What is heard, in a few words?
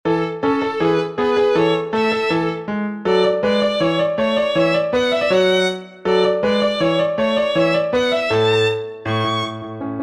Violin Sonata Movement